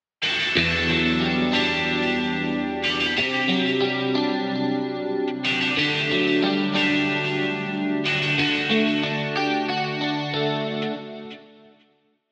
guitar pattern 2 gt

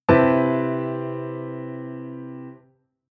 Chord Tension 11

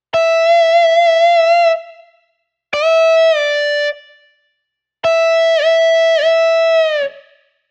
guitar bend

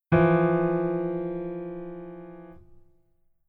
Keyboard Voicing Basic

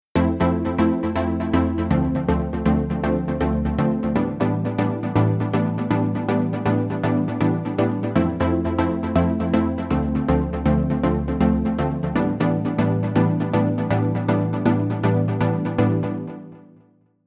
Synth Pluck Chord

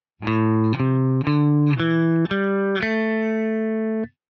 guitar minor penta

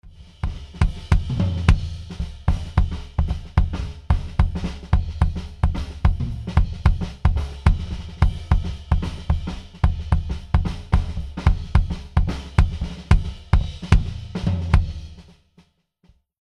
Drums Kick Comp On

